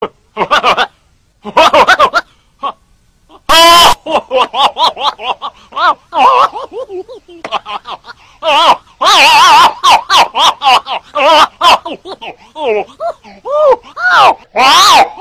risada; professor girafales

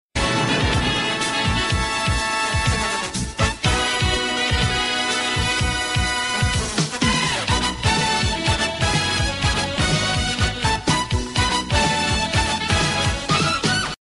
vinheta

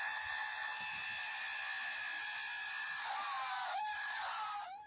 onca gritando

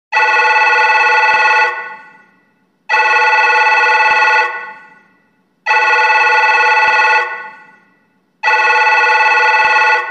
fone toque; sicoob

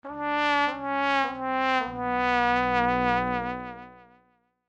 trompete da derrota; de dom pedro

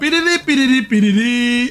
piriri priri piriri cantando

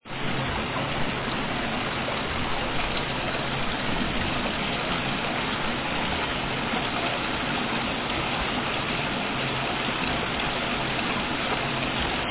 chuva caindo

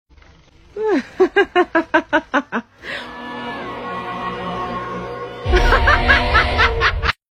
risada malefica

